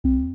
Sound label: notification